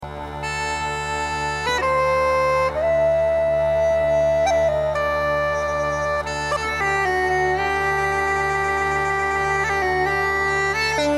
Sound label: pipes